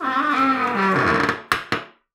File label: Door Creak